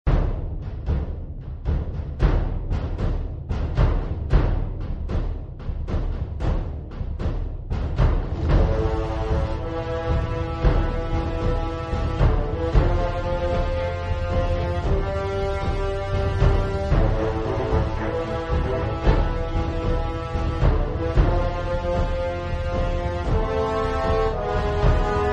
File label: music